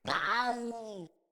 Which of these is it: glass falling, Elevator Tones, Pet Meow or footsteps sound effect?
Pet Meow